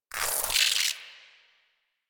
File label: foliage insect; Ambience; Props; Warframe; Duviri